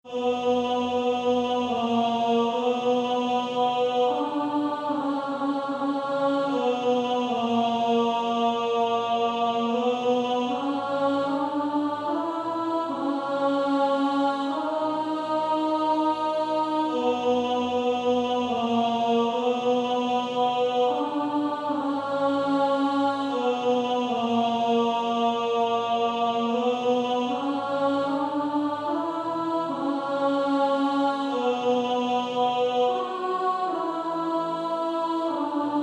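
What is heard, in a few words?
Male Choir, tenor 2, TTBB 4-part, Practice Music; Coventry Carol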